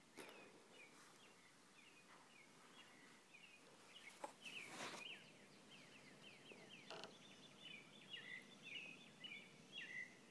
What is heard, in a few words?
birds outside my bedroom window